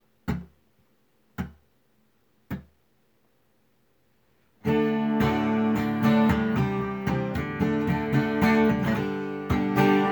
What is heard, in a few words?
acoustic; brick and mortar; go keys creamery